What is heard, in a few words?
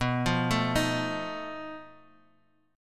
B 7th Flat 5th B down Guitar Open G